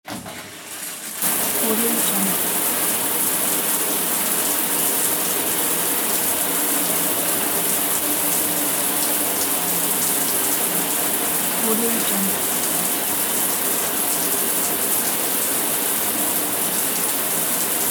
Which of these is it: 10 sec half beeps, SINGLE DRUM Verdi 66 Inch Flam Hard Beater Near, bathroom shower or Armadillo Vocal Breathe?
bathroom shower